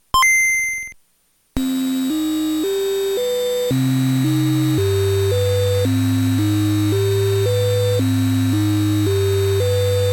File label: sines